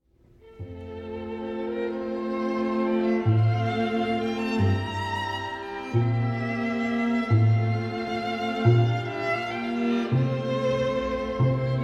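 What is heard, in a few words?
beethoven string 2nd movement; Beethoven - String Quintet in C major; Festival Montalcino 2012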